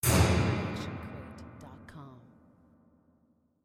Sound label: gong orch hit